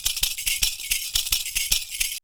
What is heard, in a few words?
PERCU; Univers Sons; Raricussions